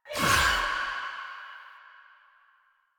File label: Choir Erupt Proj Throw; Warframe